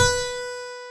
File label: lyra; theodyssey